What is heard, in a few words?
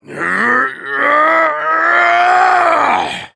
hahhh ahh gahhh!; nekomaru